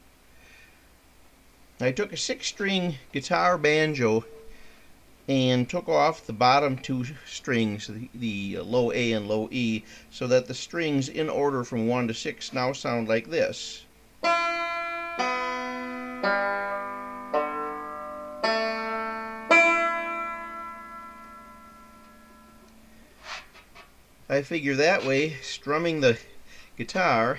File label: guitar banjo